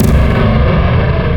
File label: boss dst; noiz2sa